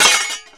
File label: Armour Helmet Hit Light; SFX Kit; Creaks, Scrapes, & Misc